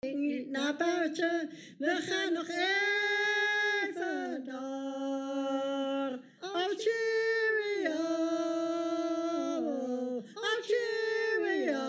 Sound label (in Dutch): fluiten